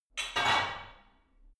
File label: barbell only replace reverberant hard